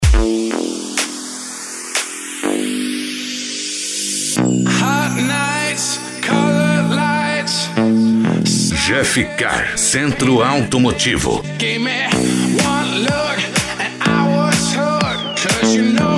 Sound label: deep house; jeff car centro automotivo